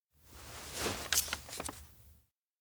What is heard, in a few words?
receive last letter; koyomi; anemoi パッケージ版